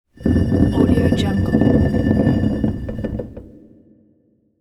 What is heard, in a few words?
ancient tomb stone push; game; جابجا شدن دیوار سنگی